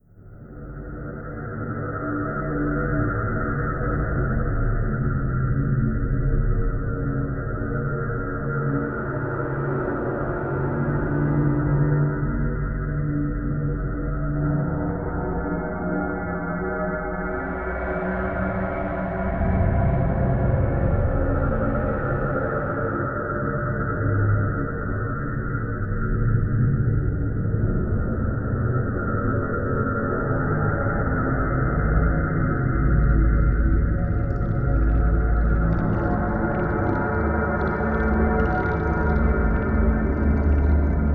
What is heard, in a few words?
Ambient